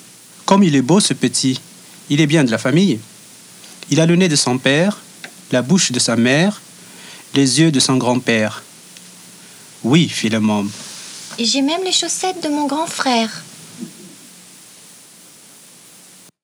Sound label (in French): rire